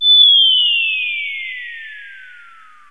fall

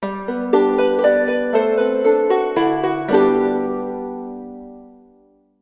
jingle

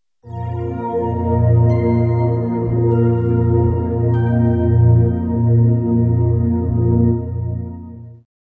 soft

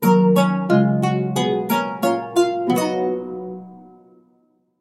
jingle

